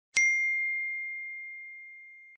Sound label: iphone 14 pro max bell iphone 14 notification